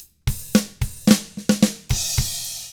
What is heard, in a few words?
POP ROCK, Double Platinum Drums; 109POP ROCK